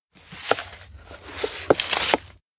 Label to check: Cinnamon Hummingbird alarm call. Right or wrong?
Wrong